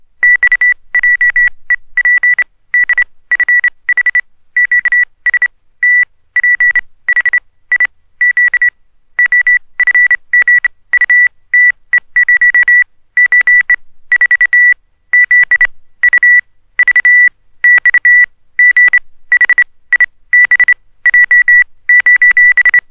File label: morse code oscillator - high pitched